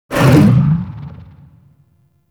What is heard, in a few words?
Maw Fishing Gulp; Warframe; Duviri